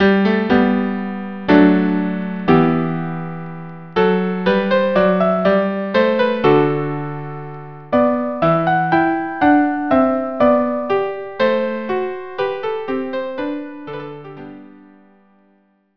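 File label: violin viola; march from Scipio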